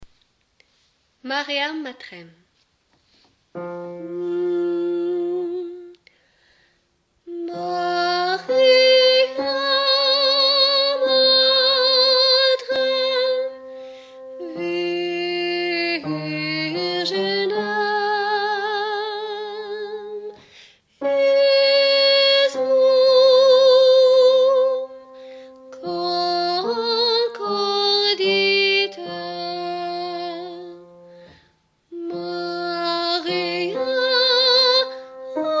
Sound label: mariam matrem, basses